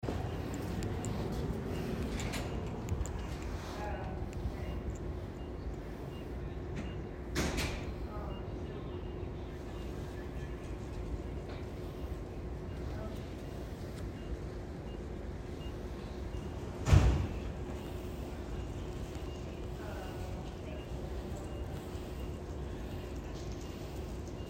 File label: Science Center Entrance; Keck